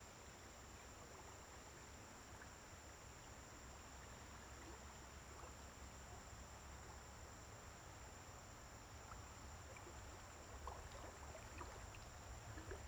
Ambiente em riacho de fazenda com sapos e rodovia perto; ambientes naturais; canais surround 5 1; Coleção Sonora do Cerrado

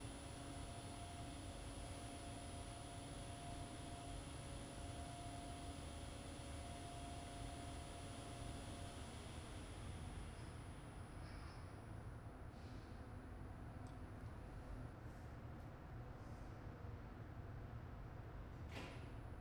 Ambiente dentro de elevador; maquinas

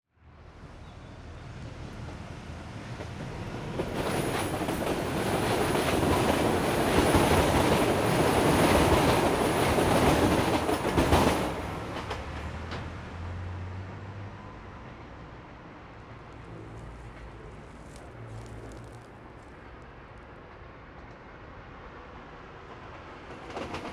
Metro em dois sentidos bem proximo passando em Linha a Ceu Aberto; maquinas

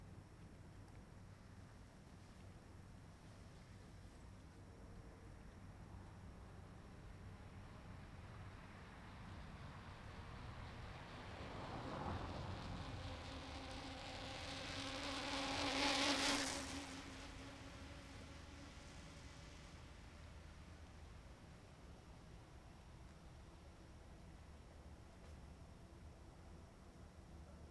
Transito bem longe bicicleta passa logo apos carro

